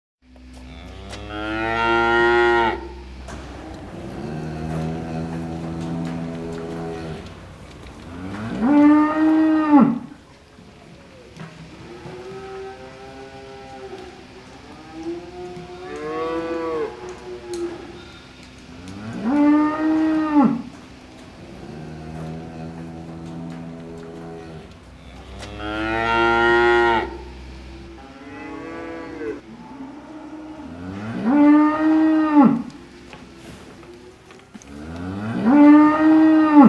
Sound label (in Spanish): Sonido de Vacas; Sonidos de Animales de Granja